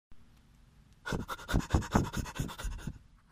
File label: breath